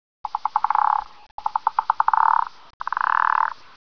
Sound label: raptor idle normal